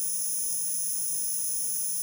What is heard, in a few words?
noise